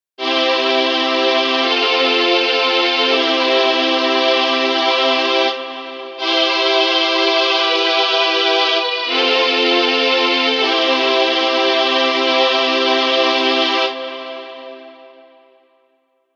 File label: Mellow Nights